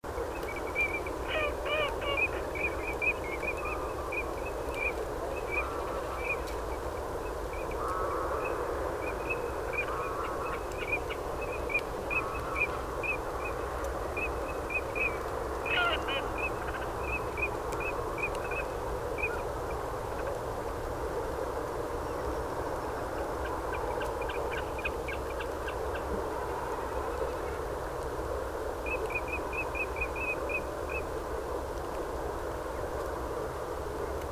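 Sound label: common teal voice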